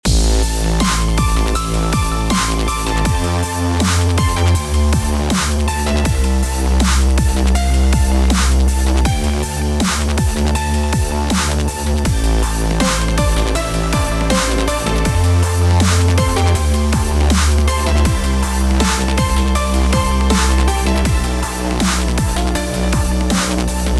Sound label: wub